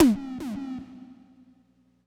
Tom Slam Robot

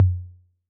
Tom Low B 808, Clean, Digital

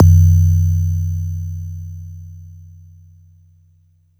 Sine Perc

